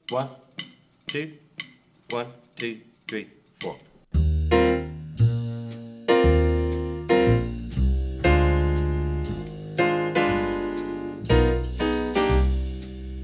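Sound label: swing rhythm section mute drums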